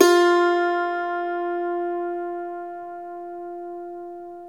IRISH HARP

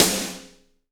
MIX SD; DRUM RYTHM; AKAI S6000 CD-ROM - Volume 1

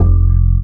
Standup F, One Shot Bass

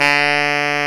SAX TENOR, Roland Super Sax; Roland LCDP07; Tenor V-sw; Tenor 4way

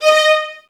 violins; ILIO; Synclavier Strings

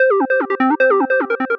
150 Cm, Melodic Loops; M8; Electronisounds

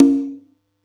Yamaha EX5, Drums

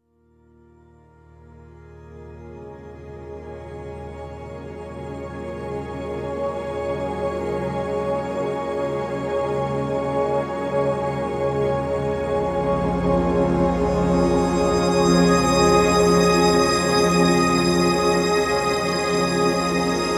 Thematic Drift Bed, Spektral Minimalism; Sonic Foundry (Sony Creative Software); James Johnson; Complete Beds

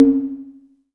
sfx perc

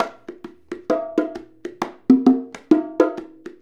Bongo; Essential Percussion

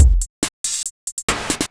Beat, Drums & Percussion; Electrocution; Beats

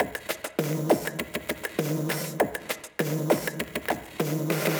Ghost Loop 100BPM, drum loop

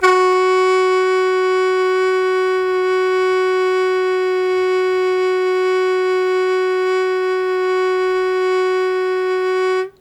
Long Sustain F#1, Harmonica; Zero G - Harmonica

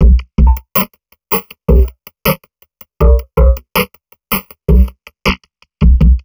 Drum Loops Crazy Processed

